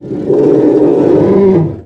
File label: cry; aptonoth